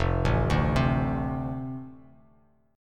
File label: F 6th F Bass Standard; F6 arpeggiated